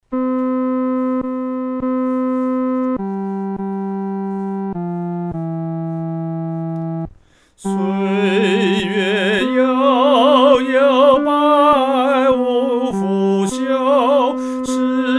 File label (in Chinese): 独唱 第三声